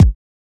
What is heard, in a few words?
Kicks